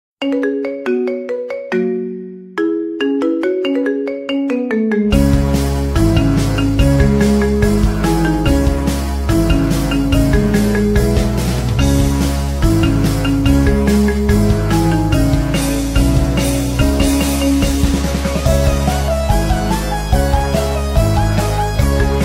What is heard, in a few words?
Marimba Remix